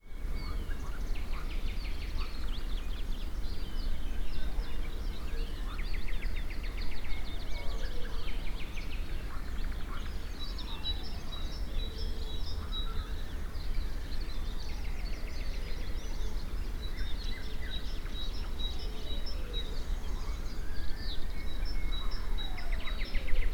soundwalk, sergiyevposad

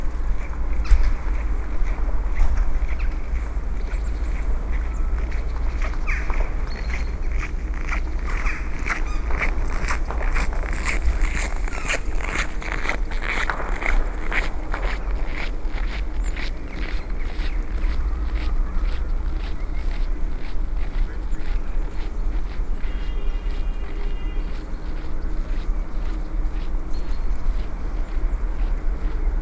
beating

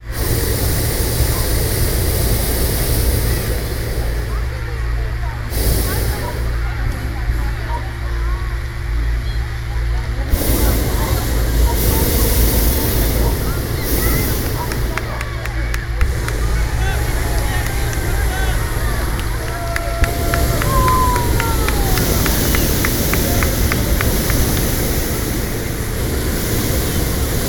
balloon festival; sergiyev posad

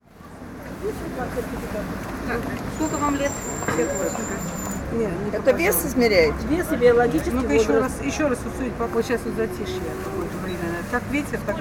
street weighing train station; sergiyev posad

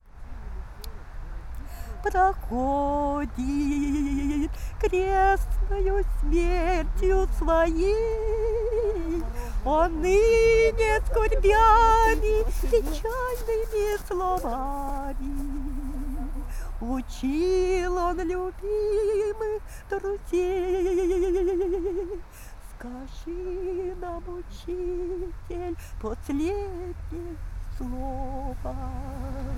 beggars song; sergyevposad

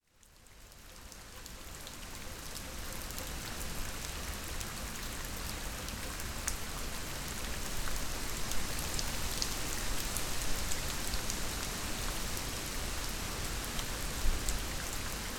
rain, sergiyev posad

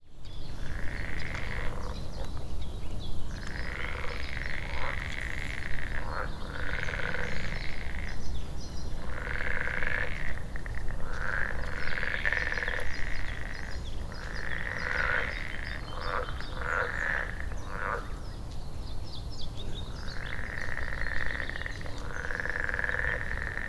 frogs, Sergiyev Posad

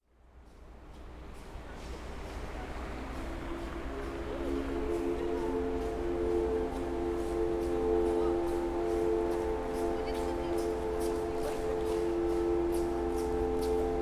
factory hooter; zomz; sergiyev posad

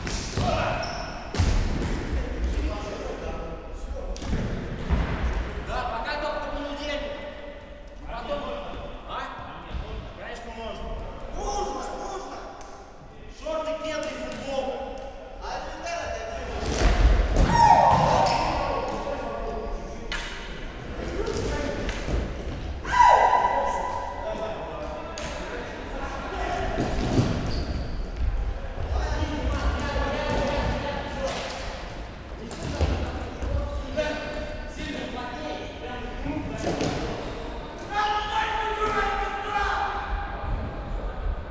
floorball; sergiyev posad

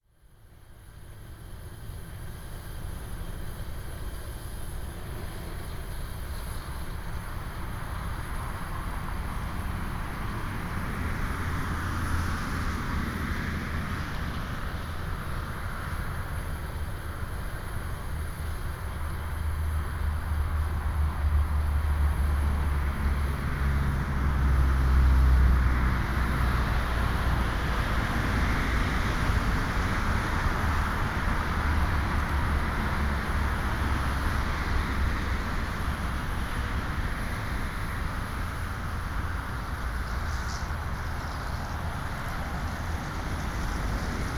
war memorial; sergiyevposad

